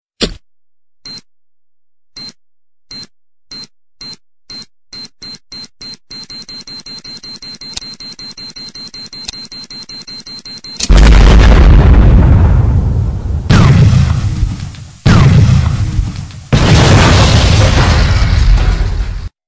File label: bomb explotion, sms; ringtones